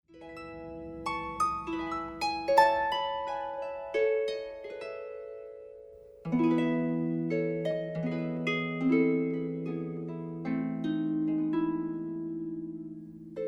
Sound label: Choral in E Major